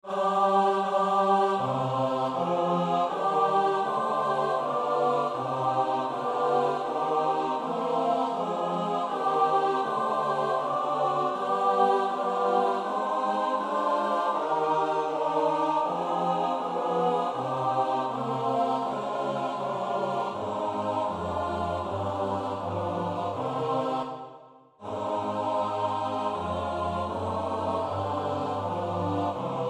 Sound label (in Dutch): In God de Vader op zijn troon (S-A-T-B); Nieuw Liedboek; NLB.342; Oefenbestanden